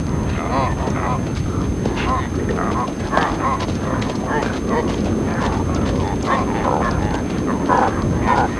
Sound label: calf sound, caribou